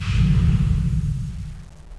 chem air

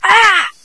pain; annabelle

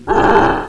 pain, baby

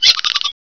maggot idle

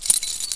m60 chain move

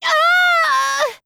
eeeeugghhhhhh

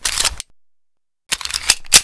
reload

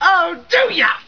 powersaw

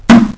impact; Physics; Plastic

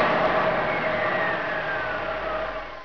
hummer stop

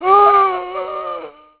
zombie attack, male

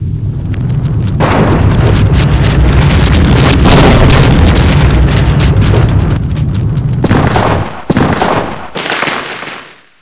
earthquake

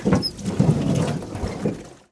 row boat

